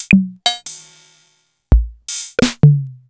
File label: Korg KR55